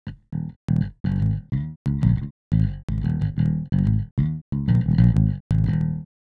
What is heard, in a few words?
bass Less Sustain on the Bass; Extractor